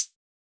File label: Analog Hi-Hat, Electronic Drum Samples; Hi-Hats; E-Punk Project Drum Samples